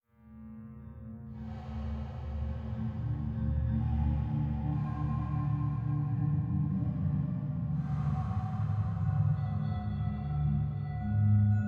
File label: banya ambient music